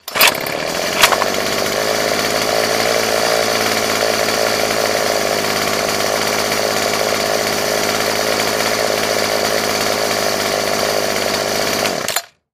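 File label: Chain Saw Steady Motor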